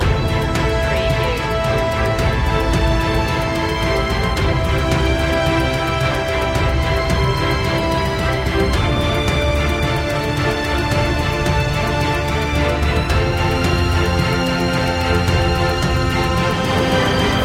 victory in the battle loop; media music now

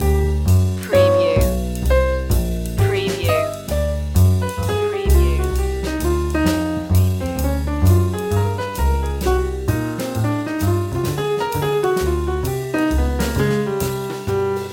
fresh jazz loop

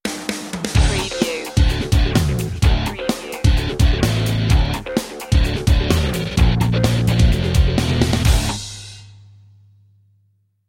break it down stinger

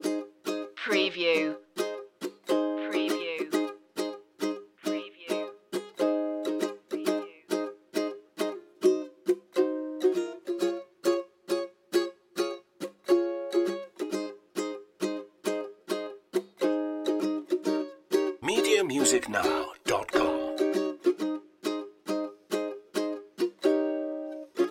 tiki beach loop

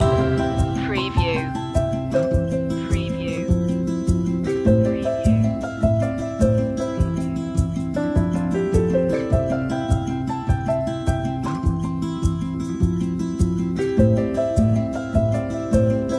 shining through loop

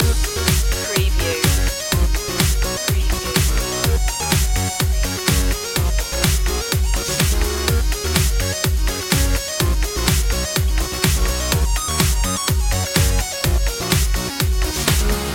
pop till we drop loop

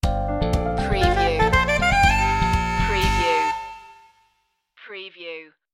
slap bass sting

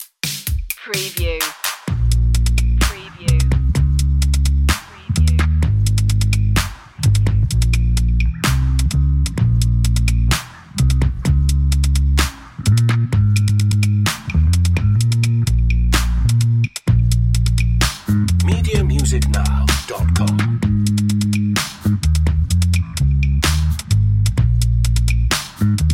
sweet memories drums and bass

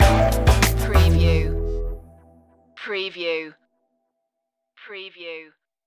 beats stinger, chill

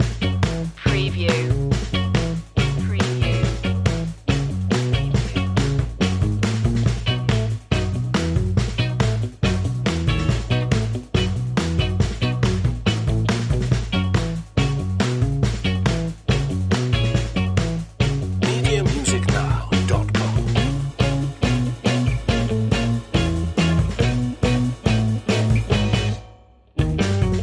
old school detroit loop

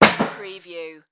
Metal Buckle

